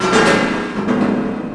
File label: Piano perc; SpecOps2MPlayerDemo; Music